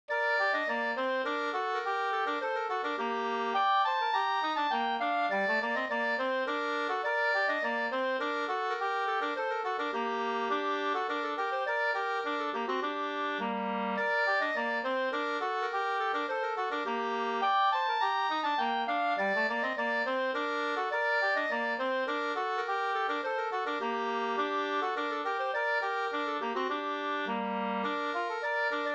slangpolska Gm 2st

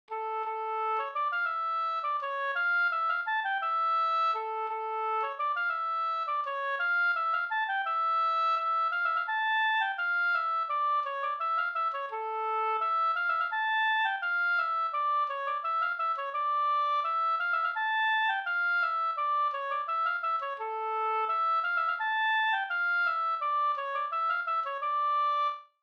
vals Brudvals

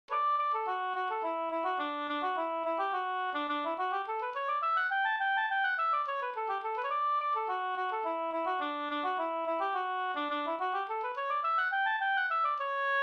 slängpolska

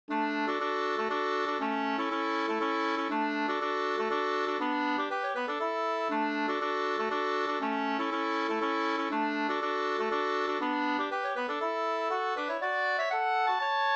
slangpolska

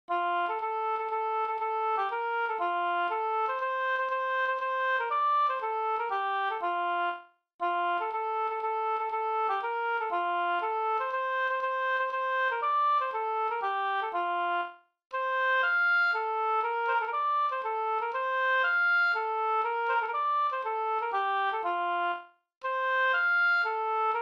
polska Sanglek; Vi ska stalla te en roliger dans

